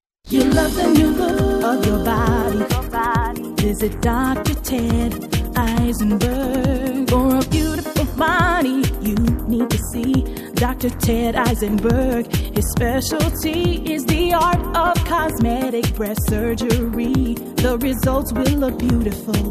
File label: jingle